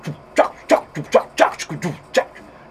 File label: beatbox